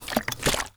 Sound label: Liquid Water Water In Plastic Bottle Moving